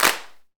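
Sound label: percussion clap, Roland; Club-50 - Foundations Roland; Ext.Snare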